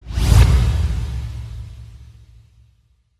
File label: Sci-fi transition fast whoosh